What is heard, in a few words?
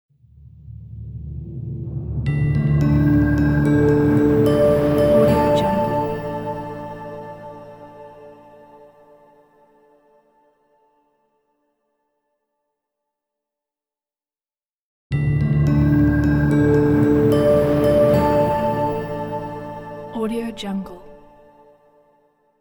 shroud of mystery logo; sound-ideas